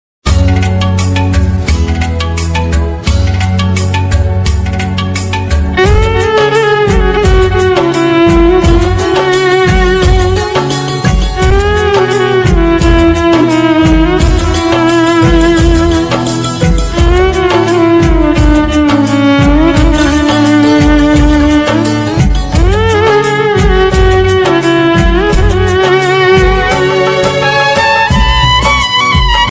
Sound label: hindi song instrumental ringtone